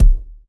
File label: Ball Player Hand Hit Tackleball Med